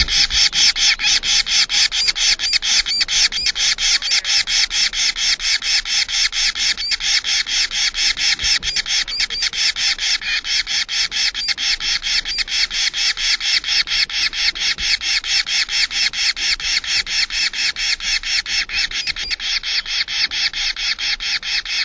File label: nestlings